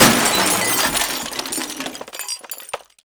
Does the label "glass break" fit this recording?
Yes